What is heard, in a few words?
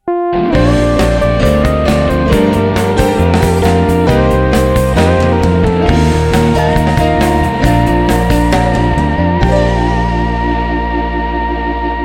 jingle short; 中央区誕生15周年「中央区の歌」アレンジバージョン